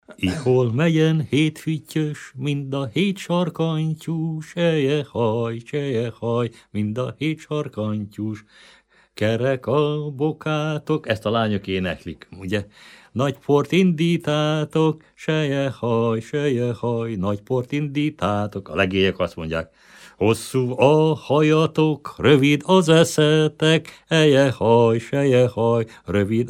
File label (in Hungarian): nepdal; imhol megyen hét fűttyös